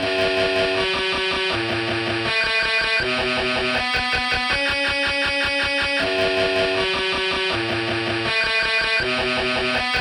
Guitar solo

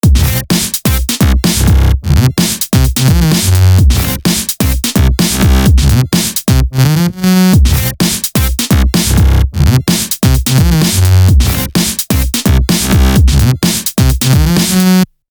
BeatLoops & Bass, Breaks BreakBeat

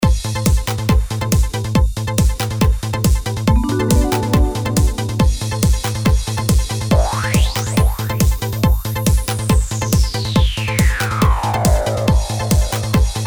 Jingle On TV

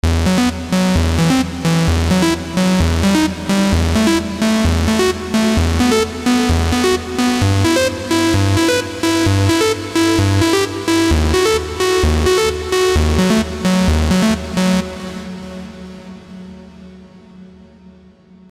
Trance Sample

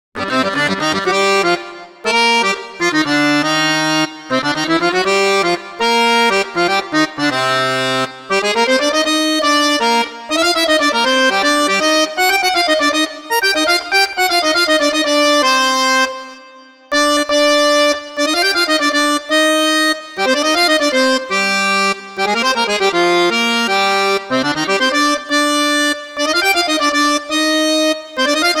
ACCORDION

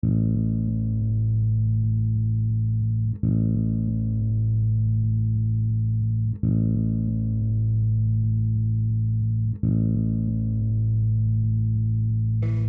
Acid rain

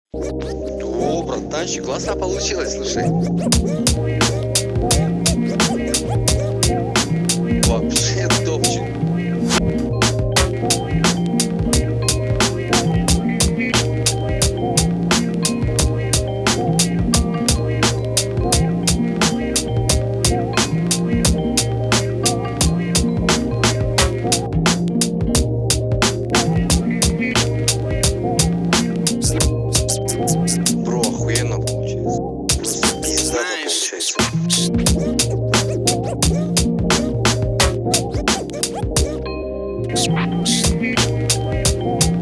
Soul Jazz Instrumental

